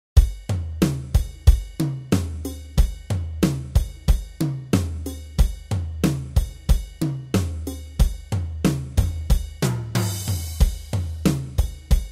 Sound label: whoohoo